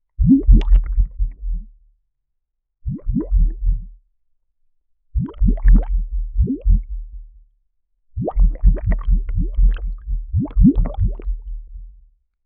bouncing on a gigantic wa, bed

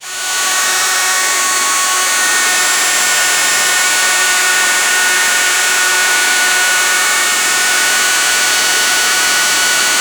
create the sound of a, mop

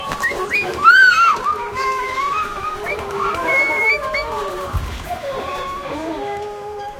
zoo ambience crowd chatte; stomps

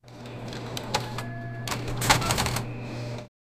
the sound of the cash, shopping

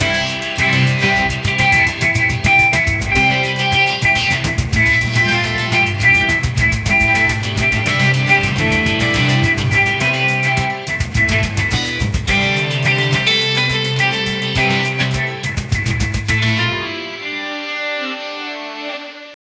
new song, guitar

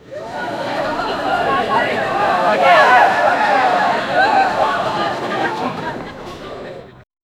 crowd people run to escap, panic

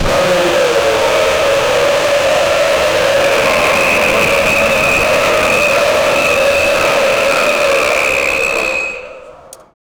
goblins, monsters

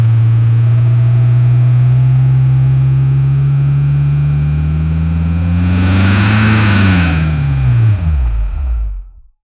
aggressive acceleration, engines

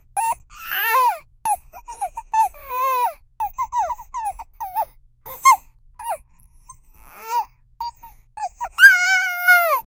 a cute small baby puppy

